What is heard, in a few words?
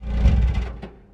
car stop, bus; russian; sven; russian sounds